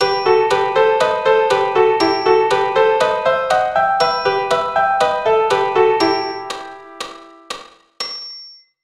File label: Em harmonic